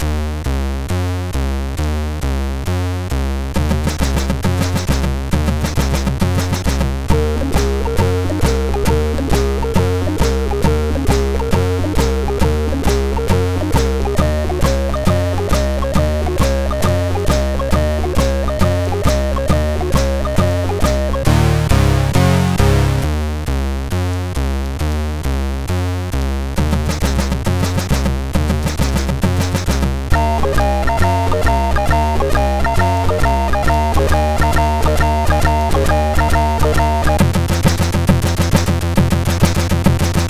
Oriental Dirge sid MOS6581R4

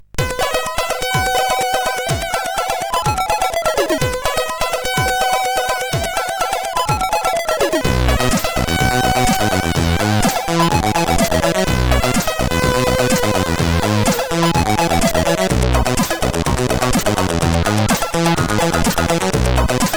Intro, CSG8580R5; Lazer Intro 5; hvsc

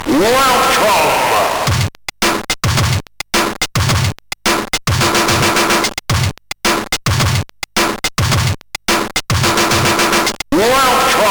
Drum Exercise, MOS6581R2

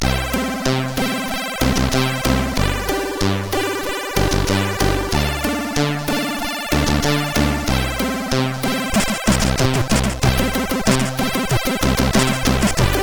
Diddling Saxes, sid CSG8580R5

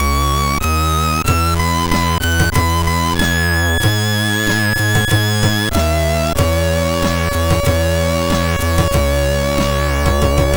Some Noise, sid MOS6581R3

